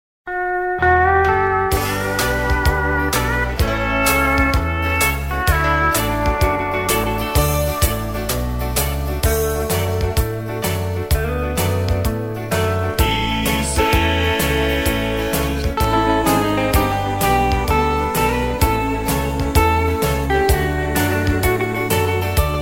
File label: harmony